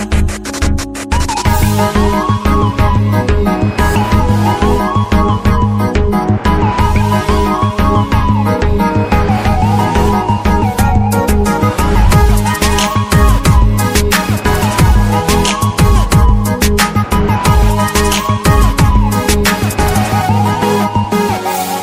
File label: huawei